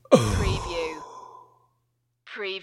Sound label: Big Male Exhale